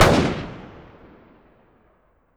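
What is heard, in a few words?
weapon ar fire player atmo exterior; gmod; viper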